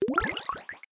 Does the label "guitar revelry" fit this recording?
No